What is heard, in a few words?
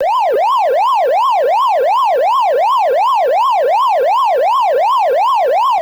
Ghost Move; Pac-Man Championship Edition 2 Plus